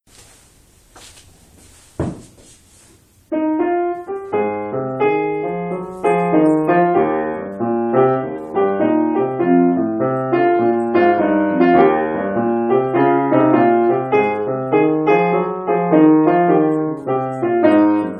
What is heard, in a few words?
three keys distortion